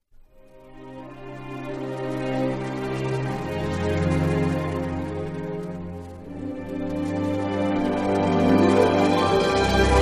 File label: Instrumental